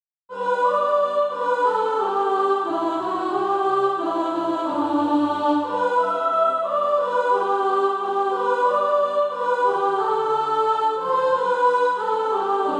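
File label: soprano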